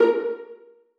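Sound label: violas pizzicato a4; Sonatina Symphonic Orchestra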